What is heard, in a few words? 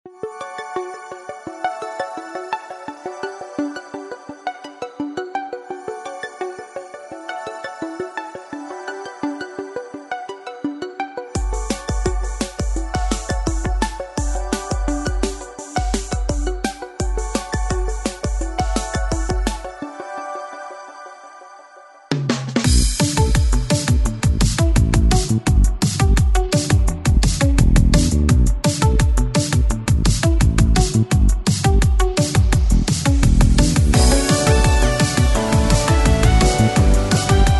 corporate